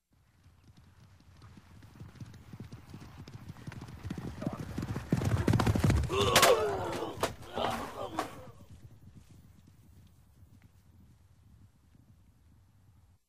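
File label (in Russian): поражение противника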